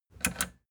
plastic button latch click disable fast

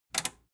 metal button tiny click enable fast

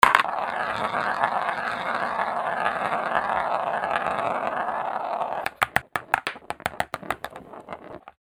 roulette

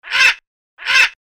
bird croak

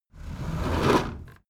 window roller shutter close soft single pull

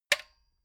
metal button stereo spring click fast

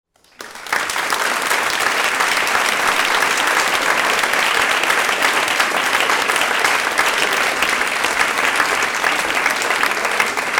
theatre applause

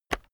keyboard external enter push heavy

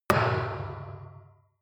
metal impact can large ring

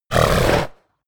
horse vocal snort

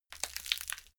wood crack slow

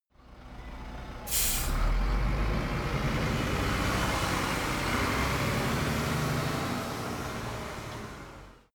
bus depart

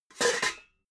pots clank roomy

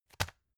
tissue package drop

